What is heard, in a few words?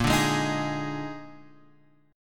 A sharp 11th; A#11 Chord {6 5 6 X 4 4} strummed